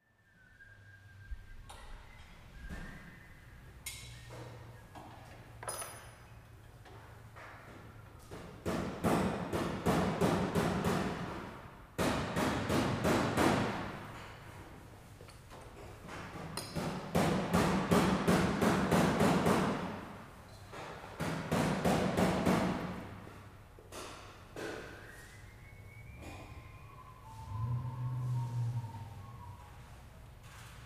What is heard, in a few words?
Automobile Body Shop, auto shop